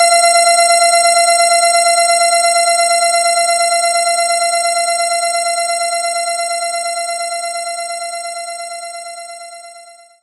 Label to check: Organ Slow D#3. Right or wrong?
Right